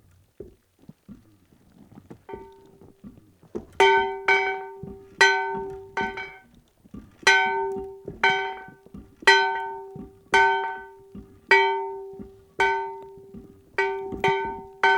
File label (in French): cloche enneigee; auriac; elle est mouchee